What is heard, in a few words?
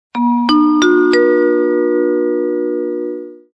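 sounds of airport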